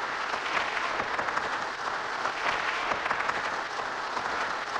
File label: Cracklature, sparse soundscape